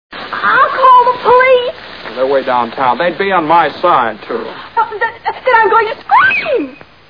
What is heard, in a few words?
scream; Wonderful Life; Donna Reed: "I'll call the police!"; Jimmy Stewart: "They're way downtown. They'd be on my side, too."; Donna Reed: "Then I'm going to scr